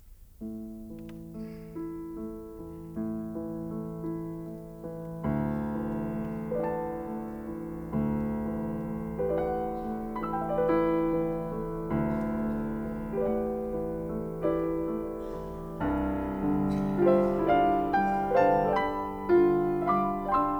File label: for piano, Pittoresco; Visions fugitives (20)